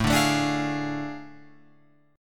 A Major 7th Suspended 4th Sharp 5th, Guitar, Open F; strummed